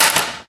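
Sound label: physics clipboard impact small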